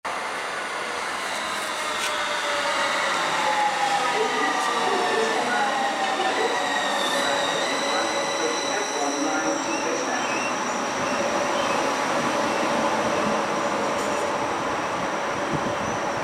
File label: Metro G1 Arrive Ground, Metro G1 ankomst; Emil Glentvor, Oktober 2022, Metro